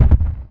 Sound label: Body Fall; Civ4 warlords